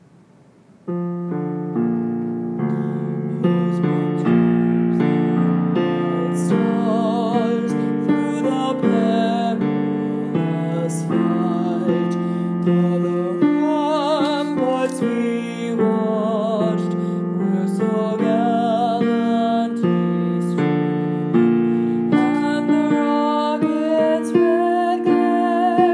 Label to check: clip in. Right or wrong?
Wrong